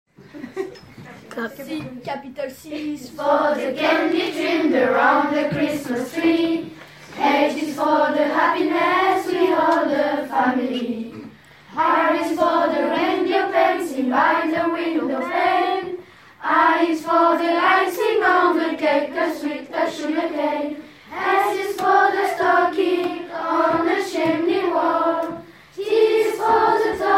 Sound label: Christmas Alphabet